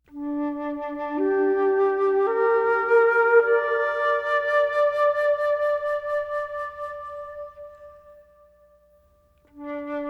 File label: flute opening